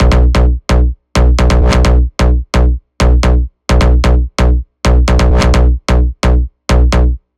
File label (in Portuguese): BEAT MONTAGEM